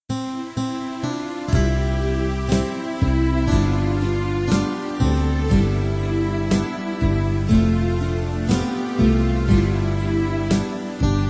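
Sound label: soprano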